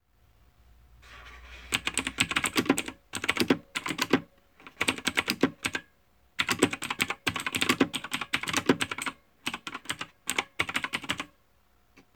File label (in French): bruit clavier